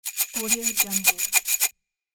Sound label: metal shake